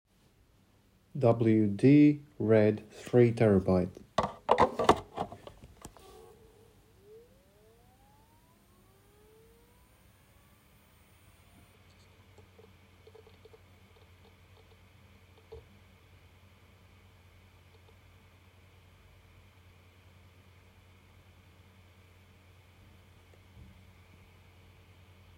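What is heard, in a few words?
wd red 3tb, hdd noise; What are the Quietest hard drives (HDD)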